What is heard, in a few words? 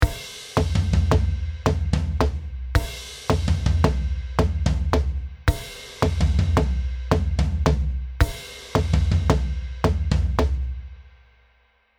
Intro metronome 110bpm